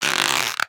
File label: Balloon squeak